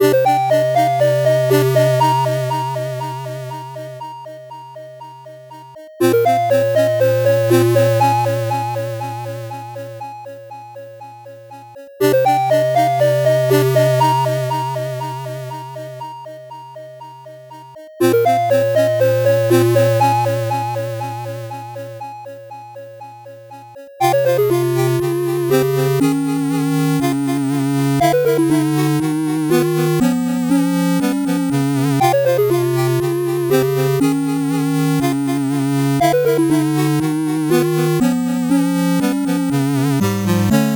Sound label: 8bit bgm fast